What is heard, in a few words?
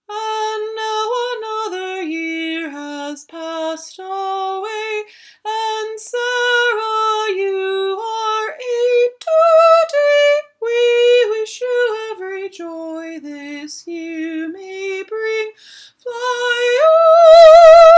Birthday Song